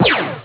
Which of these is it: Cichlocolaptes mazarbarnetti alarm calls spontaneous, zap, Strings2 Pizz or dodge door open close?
zap